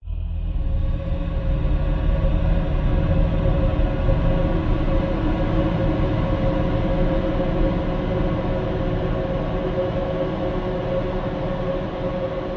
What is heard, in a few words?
swell angelic choir ahh behind dark sinister drone